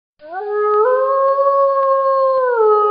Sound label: wolf howls